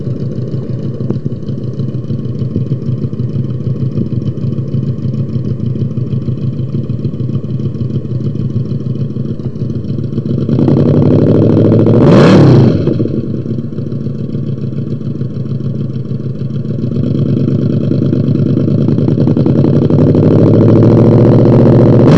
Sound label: Rolling Thunder